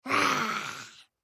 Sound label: Human Lion Roar; Blastwave